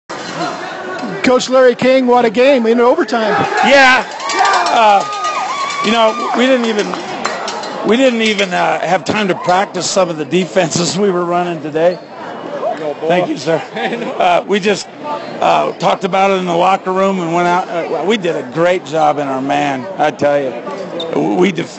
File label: Cowbell; Questa Champs